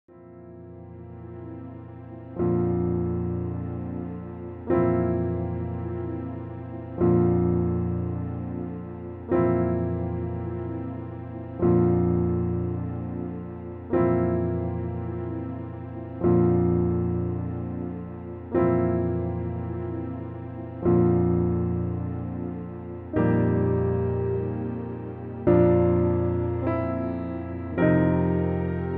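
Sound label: medium low accompaniment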